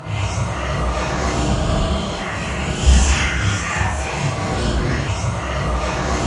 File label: fiends grip cast; weapons; dota; bane